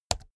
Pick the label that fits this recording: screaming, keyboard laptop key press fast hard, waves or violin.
keyboard laptop key press fast hard